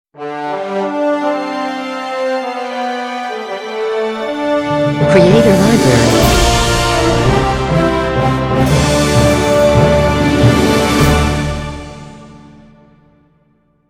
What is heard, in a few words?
Orchestra Logo; Logo-Idents